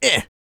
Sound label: A YELL, MALE HUMAN; R&B & Dance; ILIO; Vocal Planet